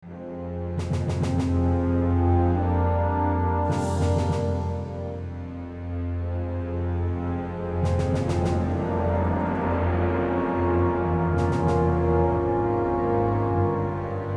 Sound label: Management Music - Allied